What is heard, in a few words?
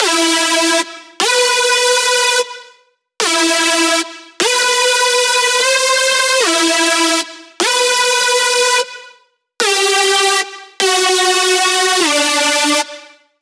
Synth Loop Nightmare E; knights; Galahad